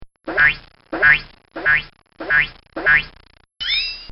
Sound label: Comical Sound Series Pop Bong; Funny Sounds